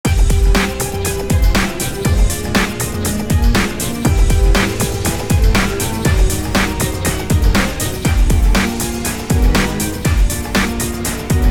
Intense Boss Music

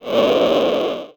sand spell

